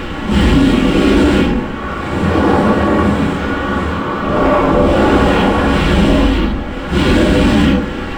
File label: Gas City Gas Behind Glass Loop; Ambience; Props; Warframe; Corpus Gas City; Props Remaster